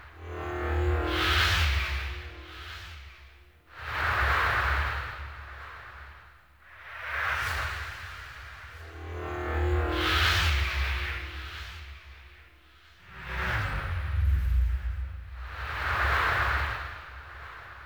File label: Amphitheatre Structure Loop; Ambience; Props; Warframe; Duviri